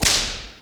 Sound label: discipline device hit world; tf2classic